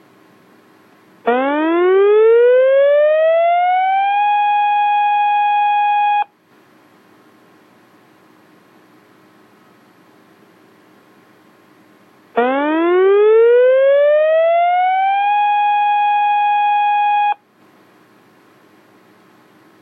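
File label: densisairen, electronic siren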